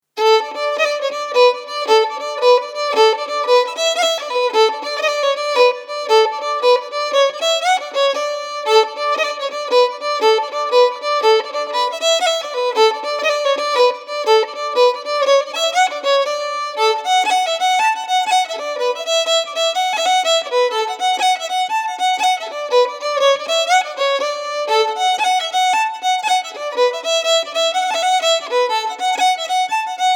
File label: Jig of Slurs